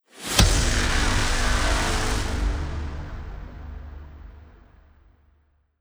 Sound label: cinematic electiric fx; gerilim; By Burak Sound Bank; tension vol 51